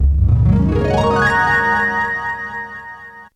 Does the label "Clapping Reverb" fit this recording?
No